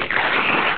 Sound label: tear papr